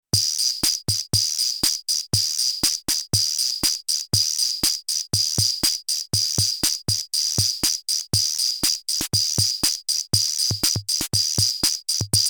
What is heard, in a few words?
Roland TR 8S CR 78S Rock